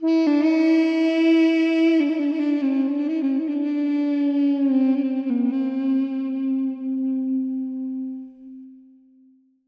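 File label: sounds of a duduk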